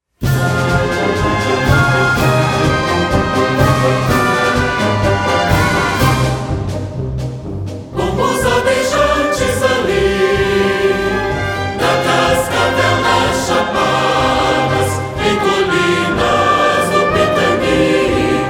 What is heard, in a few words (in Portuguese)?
marcha